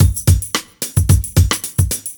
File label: Beat 110bpm; musicradar